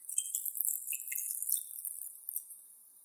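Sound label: pour salt into bowl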